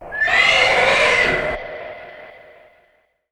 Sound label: phantasm plus; weapons; dota; chaos knight